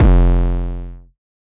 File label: 808 Bass Drip F3; Slate 808 Deep Dive; SD Signature 808s